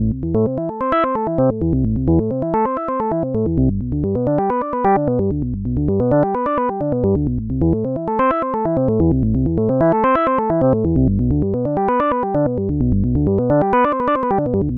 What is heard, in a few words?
Synth; Limit; Medium